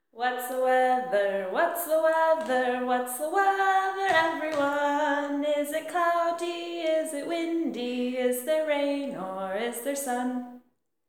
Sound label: weather song